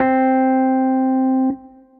Suitcase Piano; Suitcase Hallmark Bite; Ableton; Factory Packs; Electric Keyboards

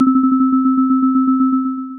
Small Jars, Wavetable Mallets

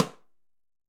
Timbales C L Mute; Latin Percussion; Drum Hits; Ableton; Factory Packs

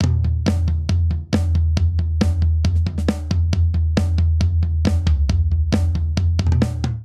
Motorik Buildup 136 bpm, Drum; 4 4; MIDI Clips; Ableton; Factory Packs; Drum Booth

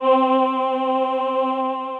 Voco+ Human Male, Voices

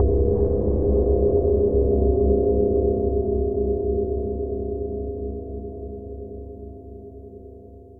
Forgotten Gong FX; Instruments; Laboratory

